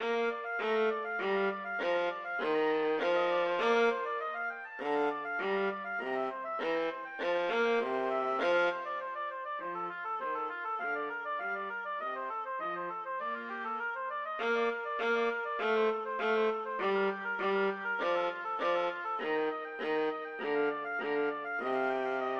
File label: handel queen of sheeba, oboe viola duet